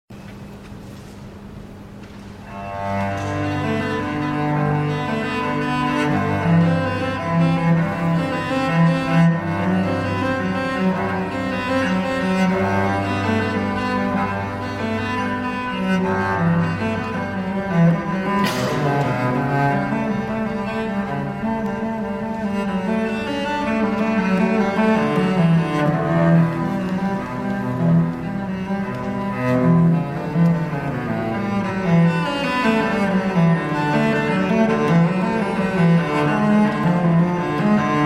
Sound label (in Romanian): preludiu din suita i pentru violoncel